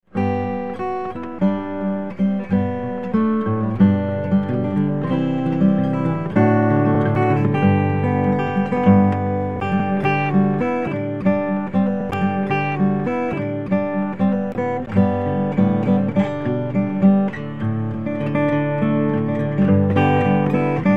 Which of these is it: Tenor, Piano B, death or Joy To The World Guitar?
Joy To The World Guitar